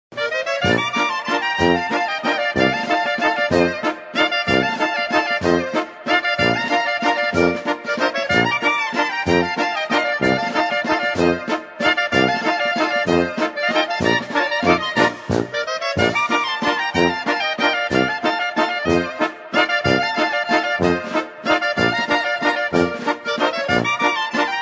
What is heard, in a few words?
Tyroler Waltz